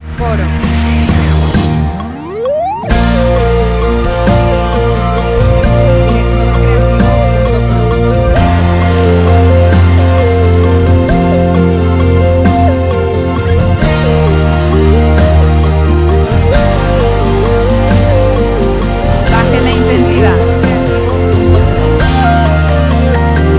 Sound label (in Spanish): EN VIVO C 66 6 8